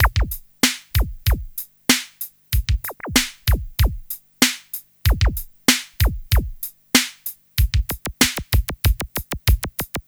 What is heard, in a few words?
Smooth, R&B Drums